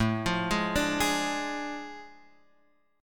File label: A Major 7th Suspended 4th Sharp 5th Guitar Open F; arpeggiated